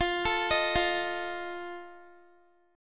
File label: F 7th, Guitar; arpeggiated; x,20,19,20,17,x